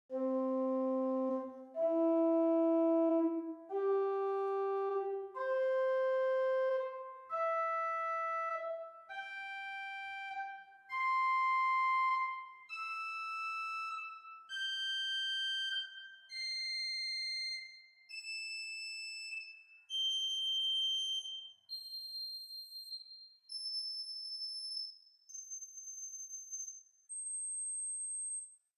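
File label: Great Fifteenth 2 arpeggio, organ stops; St Annes Moseley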